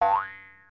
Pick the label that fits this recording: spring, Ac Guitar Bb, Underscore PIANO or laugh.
spring